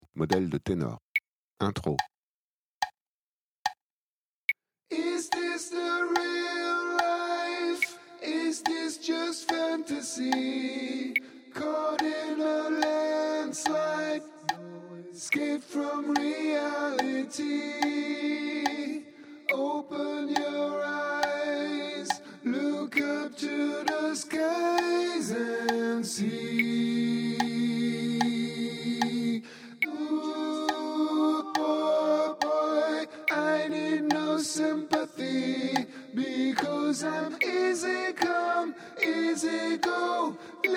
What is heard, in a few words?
Tenor Intro